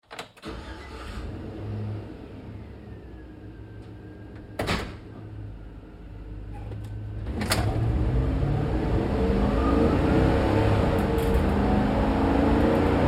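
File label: Truck GMC Savana Interior Drive Accel Decel Fast; Trucks; Big Room Sound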